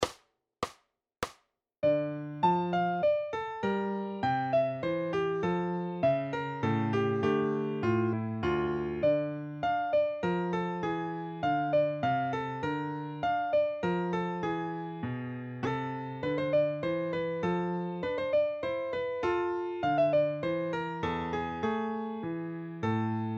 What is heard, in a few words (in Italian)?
Larghetto; Hity klasiky 1